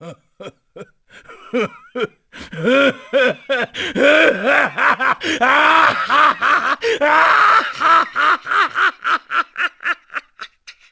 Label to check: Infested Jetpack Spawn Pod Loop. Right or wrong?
Wrong